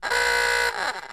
scary

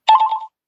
tap; gacha

